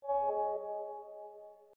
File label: Yahoo Messenger Logout